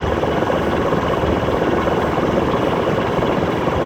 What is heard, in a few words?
ship engines; World Of Warships